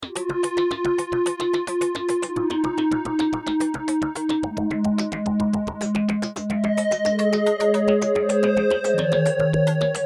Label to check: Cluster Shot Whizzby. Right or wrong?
Wrong